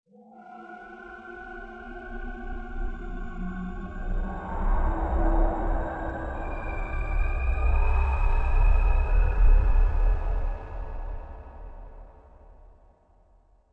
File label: Horror; The Emerald Tablet windows gamerip 2019